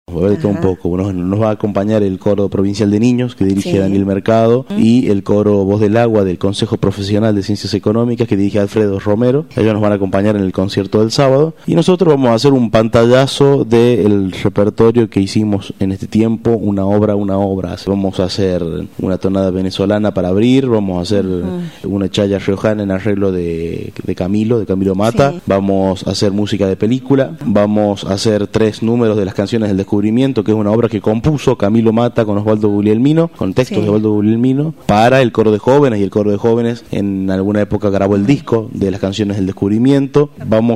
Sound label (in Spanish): Coro Polifonico Jovenes; Aniversario